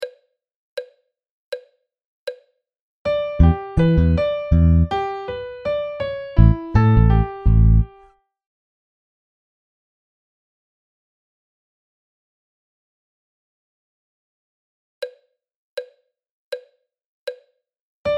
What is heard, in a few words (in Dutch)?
melodisch dictee